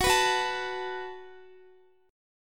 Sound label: F sharp Minor Double Flat 5th, Guitar; F#mbb5 strummed; x,x,18,16,18,17; 4F,4A,5C,5G,5C,6E; chord shape